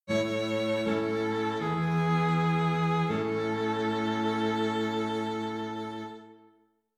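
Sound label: string quartet, dictation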